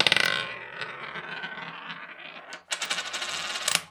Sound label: Coin Drop Roll; Coins